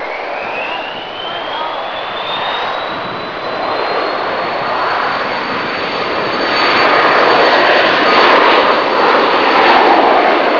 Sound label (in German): flugzeug